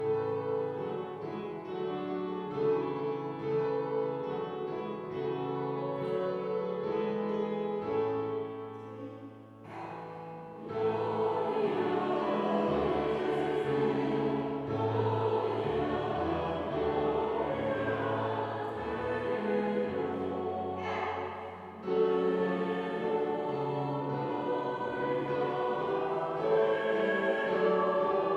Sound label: Taize Gloria Deo SATB